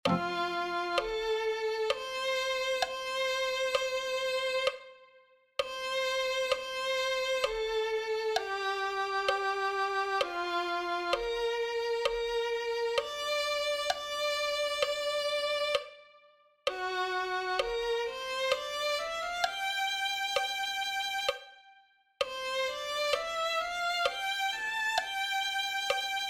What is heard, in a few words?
violin 2